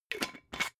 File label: glass bottle massive close fast